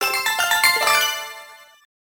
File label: Music Unlock Jingle; MKDS